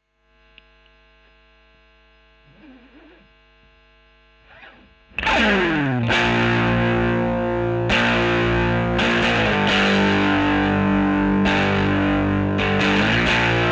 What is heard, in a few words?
E.GTR Royer OD